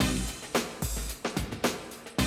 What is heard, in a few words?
Classic DrumLoops, 110